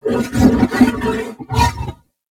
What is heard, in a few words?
Horse Trot Breath; Vehicles; Vocals; Warframe; Duviri